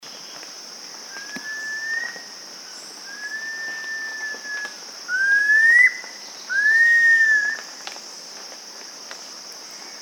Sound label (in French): tamatia barre, chant